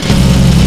mgsj fire start npc far

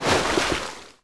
Ocean Spray; Civ4 warlords

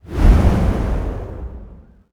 dash wind

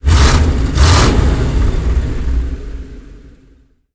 m5a engine load, tanks; War Thunder

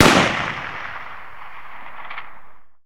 rifle shot; guns; npc; War Thunder

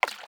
water bottle slosh fast; Rust

